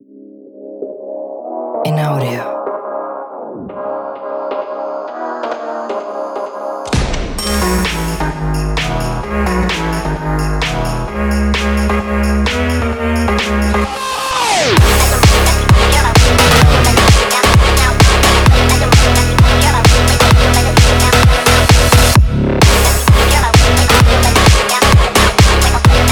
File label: Phonk